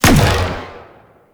Laser Disc Moa Fire; Warframe; Venus

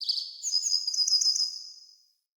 Bird Song; Galene Bird

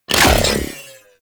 Deployable Cover Destroy; Warframe; Corpus Deployable Cover

